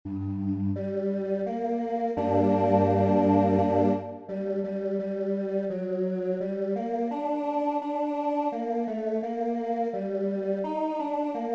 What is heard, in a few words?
Tenors; look ye saints the sight is glorious